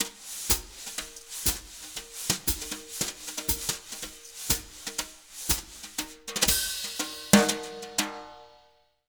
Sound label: Jazz Latin Drumloops; USB Soundscan vol.08; AKAI